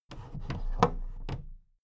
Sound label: big plastic case raise